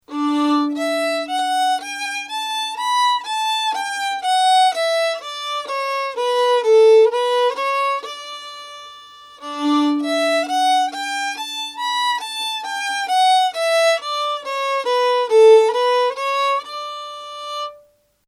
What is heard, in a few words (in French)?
Majeur; Majeur de Perrier